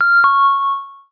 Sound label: tone alert; ringtones; sms